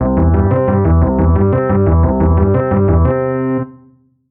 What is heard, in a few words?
levelup